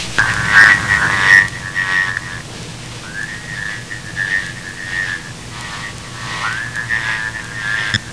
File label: fowler, frogs